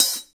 hi-hat real; Hi-Hat menus

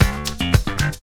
REAL BASS, BASSLICKS; Ueberschall; Jam Box

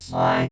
perc voice

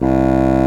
C BASSOON C2, REEDS; Greytsounds VOL-1

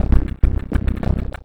Bumpers; Sonic Foundry (Sony Creative Software); Esoterik Beatz; Spitter Fx

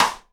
SIDE STICK, Live Snares; PlatinumPhattCD1; E-MU Producer Series Vol. 8 – Platinum Phatt

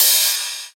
Rides, Cymbals

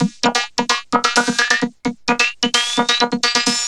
Vocobeat; Sonic Foundry (Sony Creative Software)

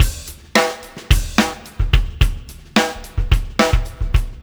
Wormhole Beat, Processed Drumkits

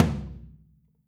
FLR TOM OH

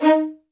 MED ATT D#, soft violins; Q Up Arts; Denny Jaeger Master Violin Library

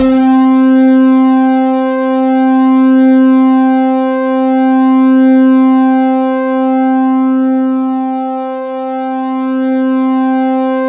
C4, SynthLine; 90 s Sample CDs; NiceBeats; SynthLine VOL-33

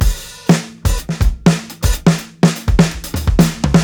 125 BREAK, DRUMS LOOPS; BASEMENT DRUMS VOL 2

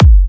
F#, Kicks; markus hakala

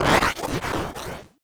Metal

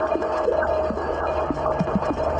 Water Scrape; Sonic Foundry (Sony Creative Software); Ma Ja Le Ethereal Textures

